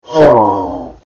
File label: pain, troll